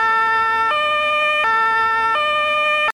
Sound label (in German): Sirene; FuStW